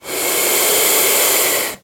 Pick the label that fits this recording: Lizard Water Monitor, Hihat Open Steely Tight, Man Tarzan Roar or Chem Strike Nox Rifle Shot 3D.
Lizard Water Monitor